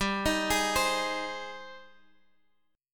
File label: Ab Major, G, guitar chord; Ab/G arpeggiated